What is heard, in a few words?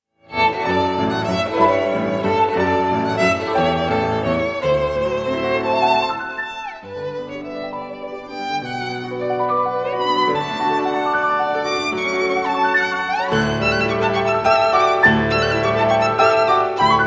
sonata for violin and piano no 2 first movement